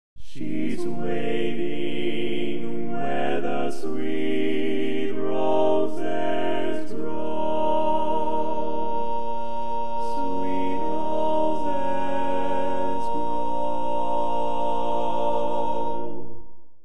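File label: Baritone, barbershop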